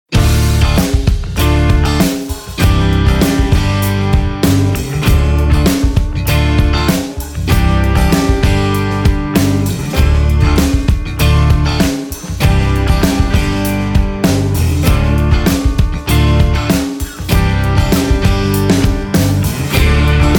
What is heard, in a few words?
strings